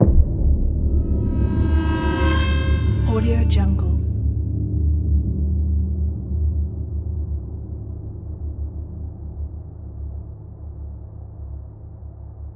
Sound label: tension cinematic suspense impact; asylum